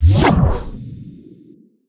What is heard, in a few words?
deform cast